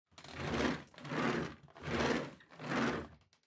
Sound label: Vacuum Cleaner Head Move